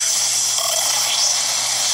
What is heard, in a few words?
jumping; Deku